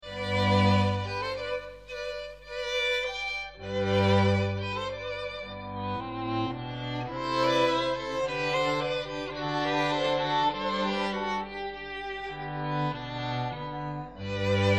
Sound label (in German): Andante G Dur Quartett; Mozart